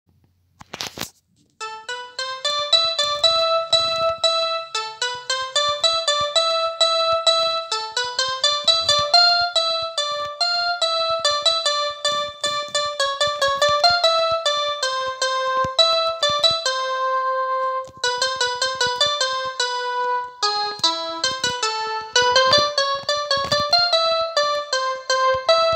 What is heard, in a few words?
Turkmen ringtone